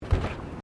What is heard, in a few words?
Footsteps Bare feet Wooden Deck Step; Footstep Foley; Footsteps (Sound Effects:Human)